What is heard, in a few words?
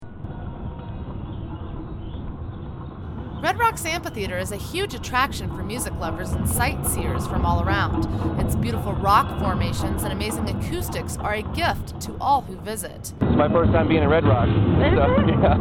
drumming circle